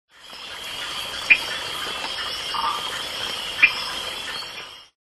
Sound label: Boophis rufioculis